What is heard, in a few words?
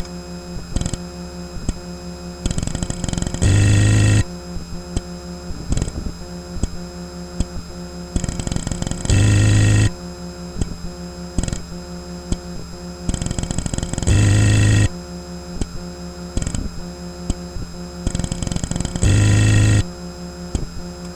ibm click grind